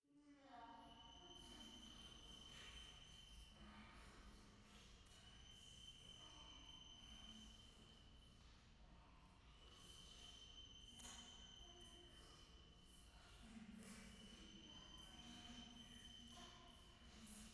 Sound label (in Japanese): 夢の中 フェラチオ 山奥